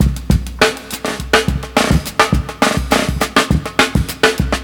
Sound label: break